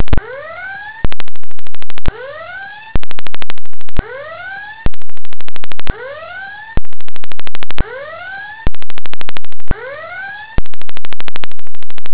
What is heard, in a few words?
klaxon